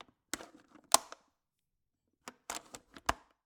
Cabin, window, latch, flip, lock, open close, hyper; Eiravaein Works; Vaeyan IV